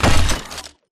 footstep shuffle; Titanfall 2

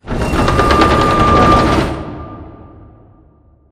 Prop Warehouse Prop Rack Rotate; Titanfall 2; Boomtown

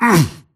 grunt hits; Titanfall 2

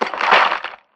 neck snap; Titanfall 2

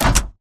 Mvmt FS Walk Default; AI Stalker; Titanfall 2

